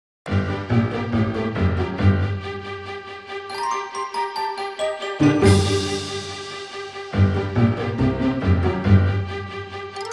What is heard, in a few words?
Cave; Tonic Trouble